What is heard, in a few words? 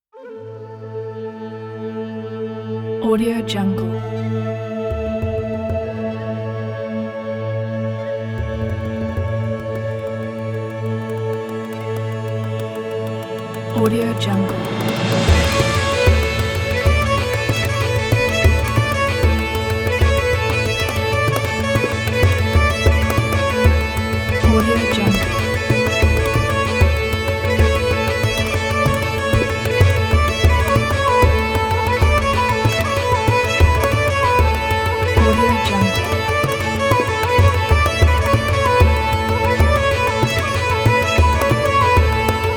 celtic dance party; romanov-studio